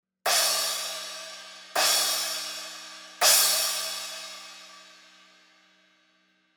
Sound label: 14 I Trash Crash Top hits, cymbal sound